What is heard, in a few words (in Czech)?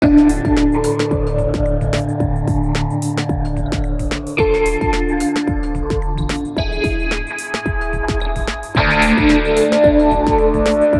ukazka kytary